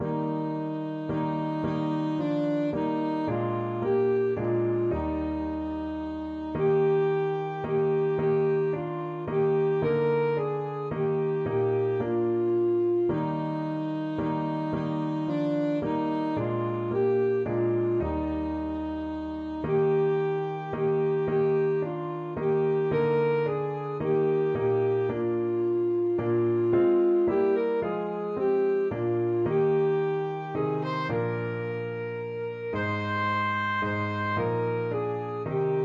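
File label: alto saxophone; holy god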